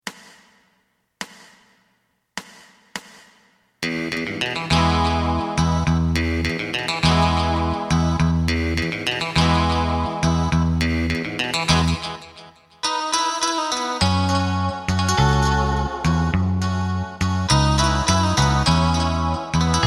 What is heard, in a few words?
drum